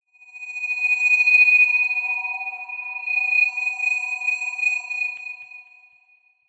sound design shrill high pitched tone panned delayed